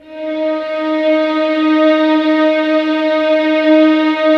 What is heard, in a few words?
violin D#4, soft violins; Q Up Arts; Denny Jaeger Master Violin Library